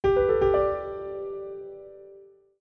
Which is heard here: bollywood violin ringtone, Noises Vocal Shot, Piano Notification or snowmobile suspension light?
Piano Notification